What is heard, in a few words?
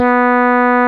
BASS E4; AKAI S-Series CD-ROM Sound Library VOL-8